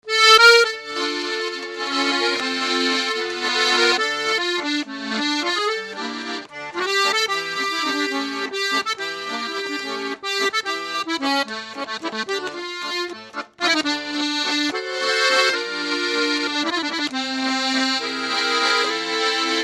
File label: Dallape 140, accordion